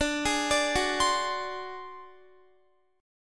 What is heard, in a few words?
D 7th Flat 5th D down Guitar; D7b5; arpeggiated